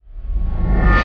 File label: metal swing; soundscrate